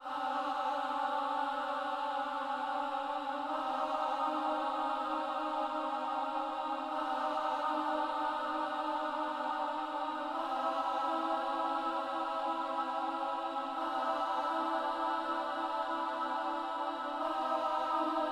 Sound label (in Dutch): drieklanken